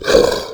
boss lite roar

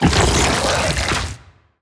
vomit; megafatty

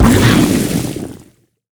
blood laser medium

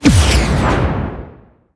death hourglass

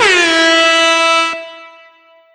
air horn; gfuel

